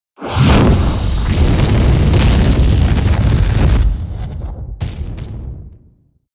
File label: Fire element spell